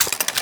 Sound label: Rifle Reload; Warframe; Weapons; Tenno; Sundial